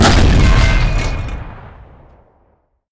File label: howitzer fire, explosions medium bomb; gbombs 5